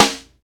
trebly snare drum g sharp key, snare tuned to the G# note. Loudest frequency: 2660Hz; snare drum one shots